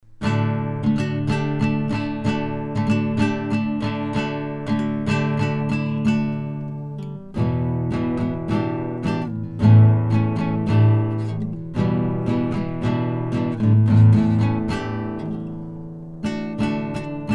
Monkey Banana Mangabey Akustikgitarre

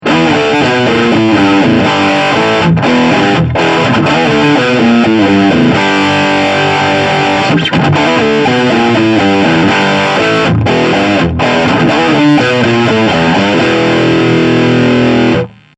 MXR Vergleich Dist III

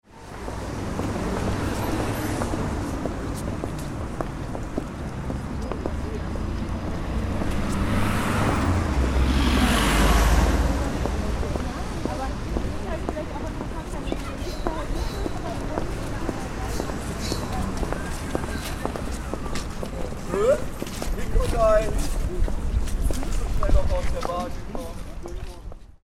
Fußgängerzone mit Windschutz

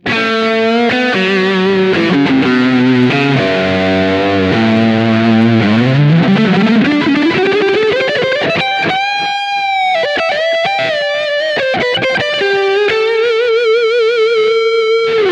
Schecter C 1 Exotic Neck Steg Humbucker Lead

